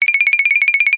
cartoon run light footed fast wooden; zapsplat

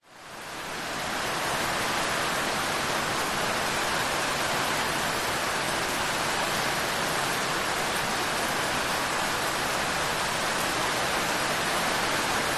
nature rain heavy thunder back yard; zapsplat

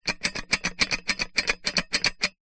metal fixing bracket lose slide movement; zapsplat; foley

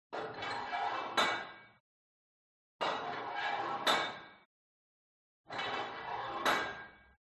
GYM Put Weight Onto Barbell Reverby Quick; SPORT

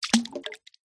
nature water small plop splash stone drop on

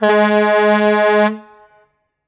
Semi Truck Horn Honk; Blastwave FX

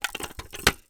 toy plastic round roll impact object

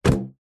foley balloon inflated throw with fingertips; zapsplat

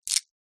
household necklace small stones pebbles catch

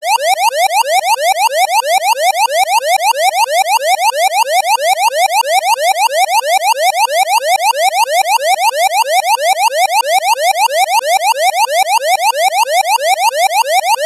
emergency alarm siren

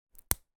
rice cracker snap break; zapsplat

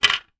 plastic phone cover down on surface; foley; zapsplat

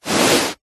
foley hand on carpet single short rub; zapsplat

